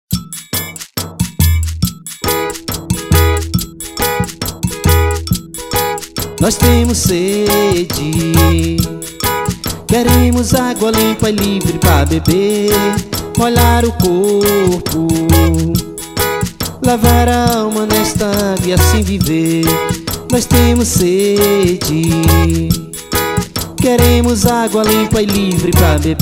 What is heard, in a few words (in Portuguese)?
Canto da agua; 14 intereclesial cebs